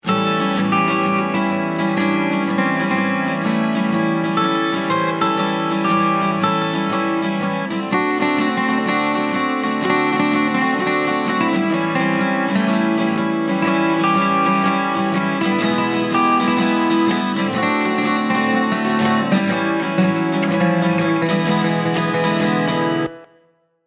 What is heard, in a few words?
12 Bar Blues Acoustic Guitar